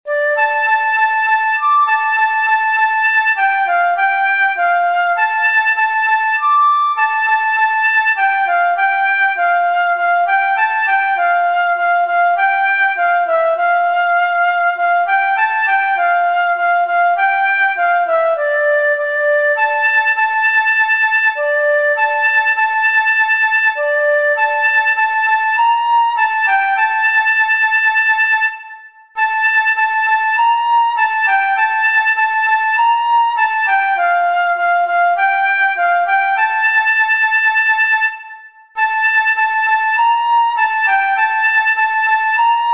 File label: flute, solos